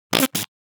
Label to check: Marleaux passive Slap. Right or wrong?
Wrong